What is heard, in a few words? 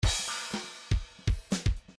grooves funk